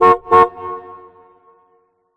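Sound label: Ambience Tennis Crowd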